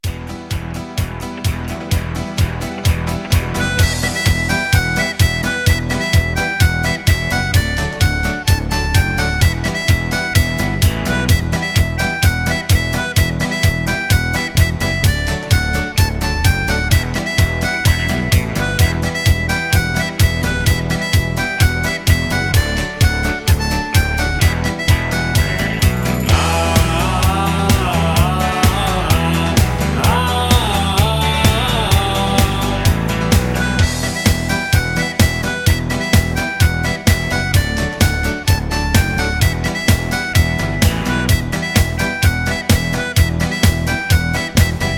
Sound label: Instrumental with Harmony